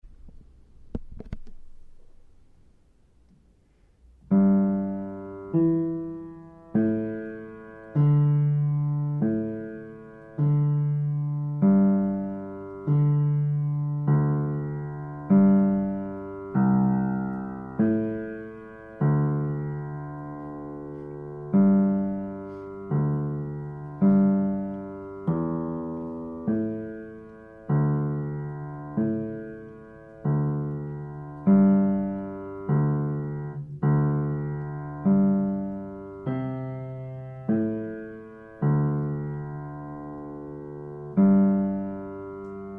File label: Bass